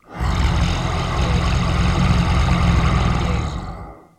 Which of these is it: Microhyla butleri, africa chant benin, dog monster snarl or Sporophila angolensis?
dog monster snarl